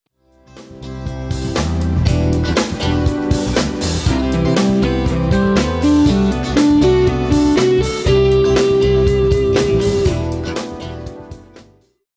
Fretboard Navigations, Guitar; Guitar Techniques 2018 Issue 289 December

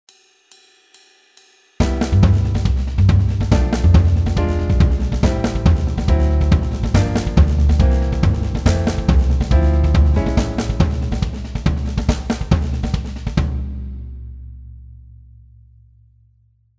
Jazz backing, Guitar; Guitar Techniques 2017 Issue 272 August

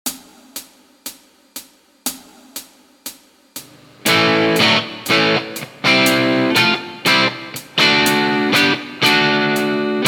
Lickbag, Guitar; Guitar Techniques 2019 Issue 297 July

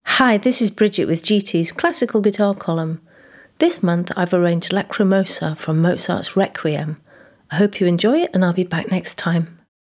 Classical intro, Guitar; Guitar Techniques 2018 Issue 289 December

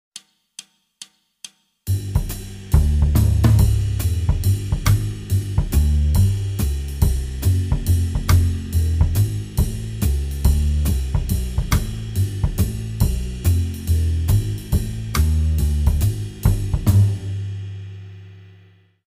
Jazz backing, Guitar

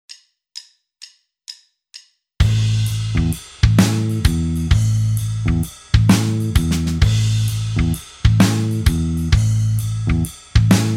backing; Guitar Techniques 2018 Issue 280 April

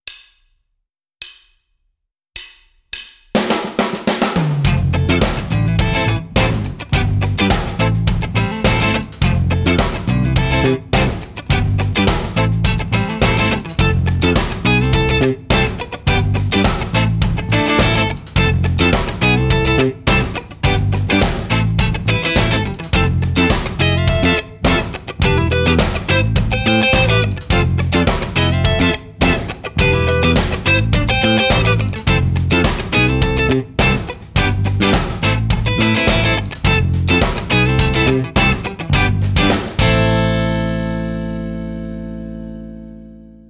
Rhythm Guitar

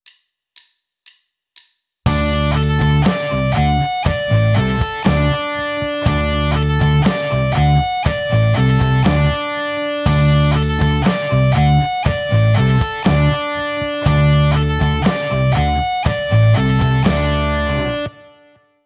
Tapped Harmonics, guitar; Rockschool; Guitar Techniques 2019 Issue 290 January

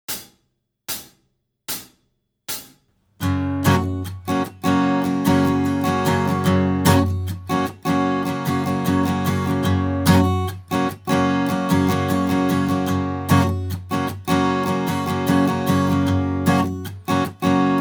Slide Jam Track backing; Guitar Techniques 2018 Issue 281 Spring

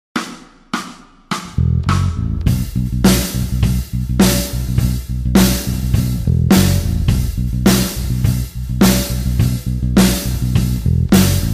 Slide backing; Guitar Techniques 2018 Issue 278 February

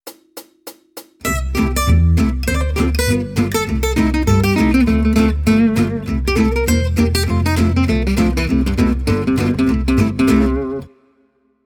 Jazz, Guitar; Guitar Techniques 2019 Issue 290 January

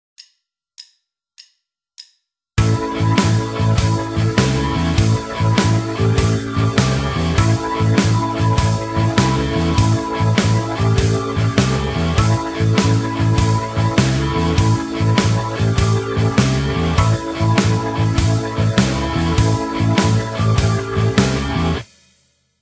Rockschool backing; Guitar Techniques 2018 Issue 278 February

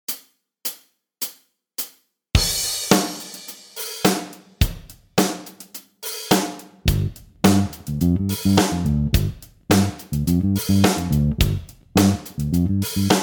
Slide Jam Track backing; Guitar Techniques 2018 Issue 288 November

